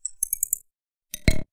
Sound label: Clicks weird